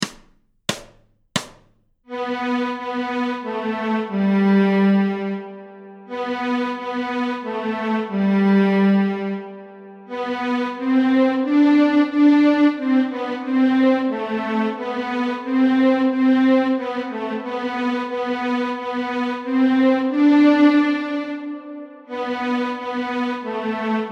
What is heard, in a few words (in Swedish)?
Vinter adjö Stråk G, Fiol